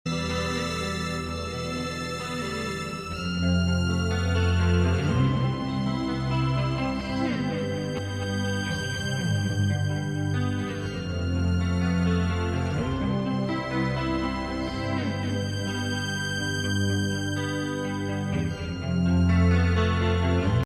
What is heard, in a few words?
space hop